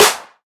noisy snare sample g sharp, Royality free steel snare drum tuned to the G# note; snare drum one shots